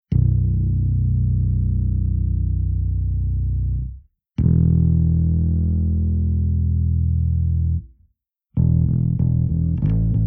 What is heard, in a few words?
ASH VALVE DRIVE